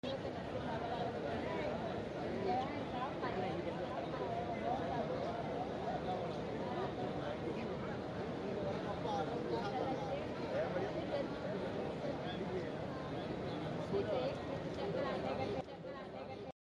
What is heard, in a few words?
haram ambient